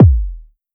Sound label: Kick Pinched, 808 Kicks; Slate; Vintage Drum Machines Volume 2 808 Edition